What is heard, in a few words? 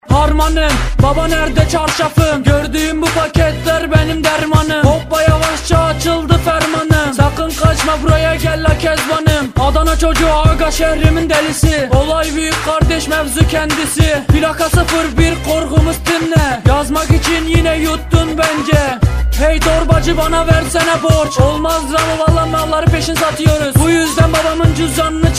Rap ringtone